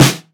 raw steel snare drum sound c key, Royality free snare one shot tuned to the C note